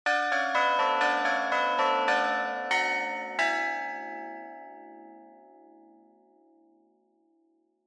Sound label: Quiz Results